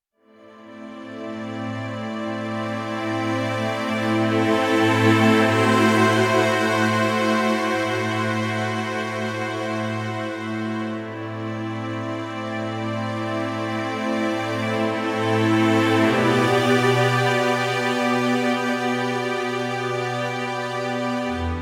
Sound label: Dramatic Cue